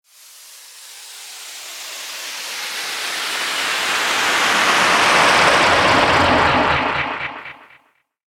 Land This Ufo, spacecraft sound effects; science fiction sound effect; Andrew Fleming; Twistedtracks

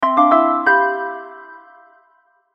Musical Happy Synth Chords Reverb; Synths; Blastwave

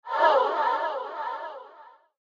Air Whoosh Flanger And Delay; whoosh sound effect

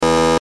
Buzzer Button, Buzzer Button Signal Loop; Multimedia; Blastwave

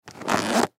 Tennis Bag Unzip; SPORTS; Tennis (Sound Effects:Sports)

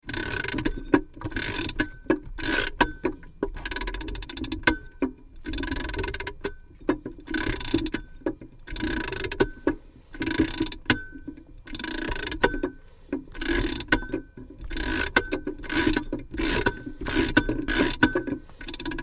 Winding Clock Spring, Old clock winding with key; Objects

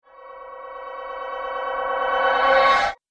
Fading Ghost Whoosh; sound design

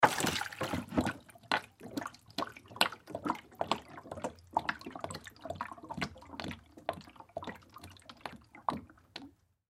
Jug Pour Empty, Water Jug Pour Empty Chug Gurgle; Pouring; Blastwave

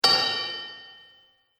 Metal Reverb Hit

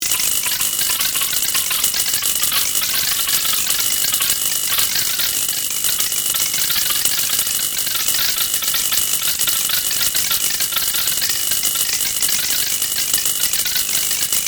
CREATED AMBIENCE SHORT CIRCUIT; Artificial; Various ambience created through sound manipulation in FMOD

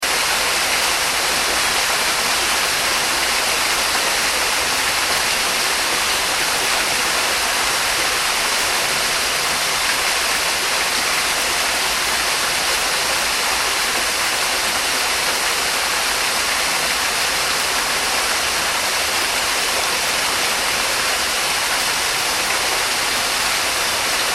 water waterfall small; Rivers; Nature

